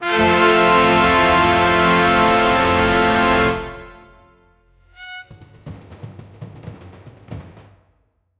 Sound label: explosive mega win, carnival